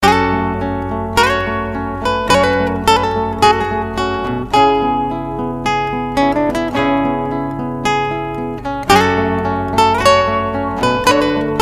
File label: Guitar